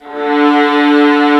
Vla D2; Digital Sound Factory Virtuoso

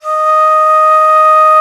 alto bon, India winds; World Combos; E-MU Producer Series Vol. 7 – Old World Instruments

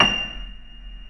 Keyboards Acoustic, F6; USB Soundscan vol.17; AKAI

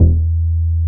DR. LOOP, BASS; X-Static Goldmine 3

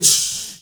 M FX FILL, MALENOISE; Spectrasonics; Heart of Africa 1

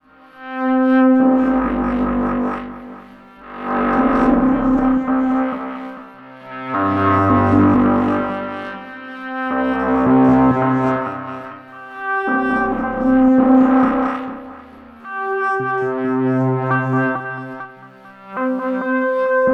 grungelised C Min, Textures

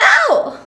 VOX, Singin

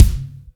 Misc Kik, Drum Machines, E-MU MoPhatt; 300 Drum Machines

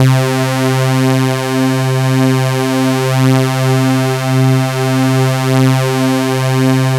OH BEE, KURZWEIL; BASIC-KW2000

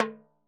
Timbaleta Drumhead; Best Service; Festa Latina VOL-1; Single-Hits

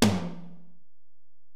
Tom, Alesis DM-PRO, Drum Machines, Toms

